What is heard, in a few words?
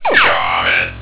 phasers; VitalWatch; shaman